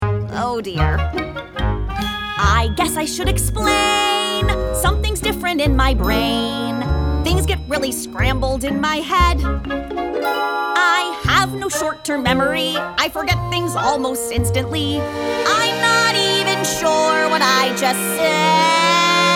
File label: Ditty Vocal cut